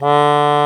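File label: bayan D3, Russian; EdgeSounds; Drum Mashines VOL-1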